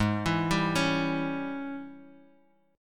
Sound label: G sharp Major 7th Suspended 4th Sharp 5th G sharp, down, Bass Standard; arpeggiated; chord